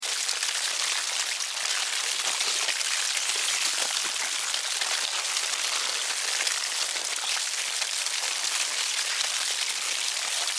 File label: Insect Colony Devour Carcass Chew Bite Group Attack Loop; Insect Eating